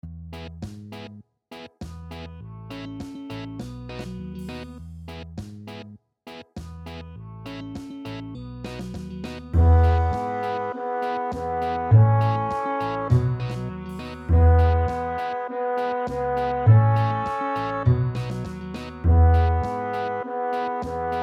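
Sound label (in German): reaggie für kontrabass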